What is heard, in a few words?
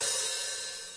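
classic hip hop open hi hat; STYLE KITS (Hip Hop, Etc); Classic Hip Hop Mega Kit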